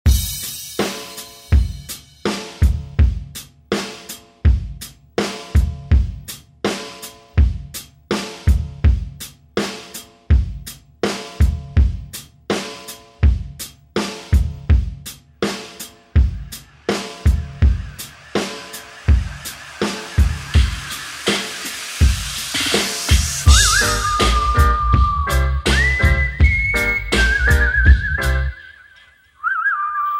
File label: 80s ReDrum; DJ Mhark